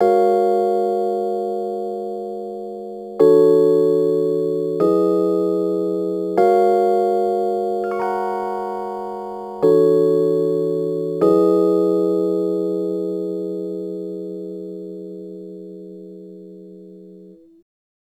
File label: Rhodes Attacks; Ueberschall